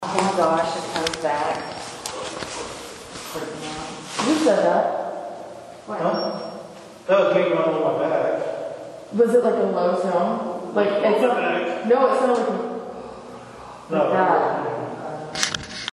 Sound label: recorder 4th floor male gasp; male gasp that was heard